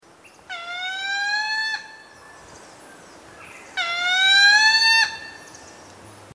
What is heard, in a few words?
Grey breasted Mountain Toucan Andigena hypoglauca